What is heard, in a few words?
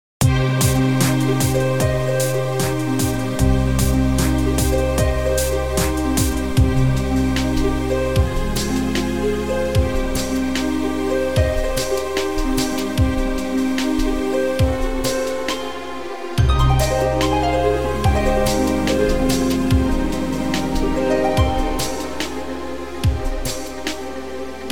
modern piano minus